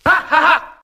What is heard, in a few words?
ha ha ha; Voice; ooh ahh